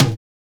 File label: TOM; E-Lab; X Static Goldmine 1